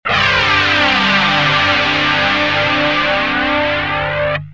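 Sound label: guitar boss bf1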